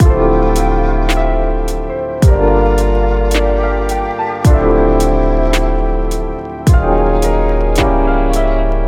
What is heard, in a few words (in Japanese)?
lo-fi トラック